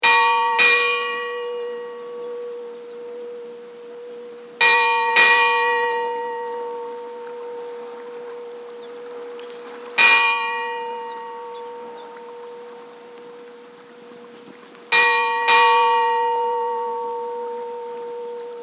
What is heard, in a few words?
Bell Sea Buoy; Musical